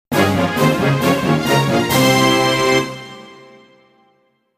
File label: BGM Player Win